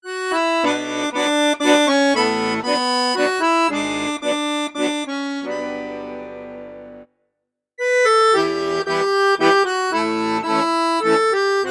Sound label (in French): registre basson piccolo, accordéon; cavagnolo professionnel 12